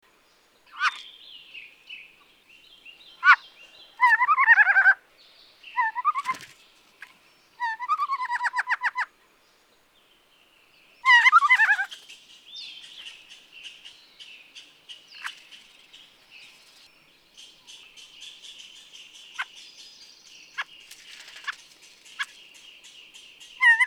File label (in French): Mesengeai, foret